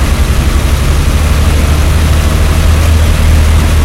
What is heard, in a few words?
stug40 engine rpm 99 load, tanks; engines; War Thunder